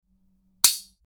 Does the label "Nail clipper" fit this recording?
Yes